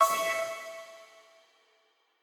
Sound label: Quasar Clear B